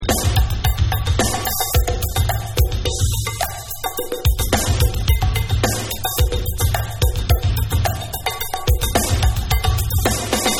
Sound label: Bonus Beats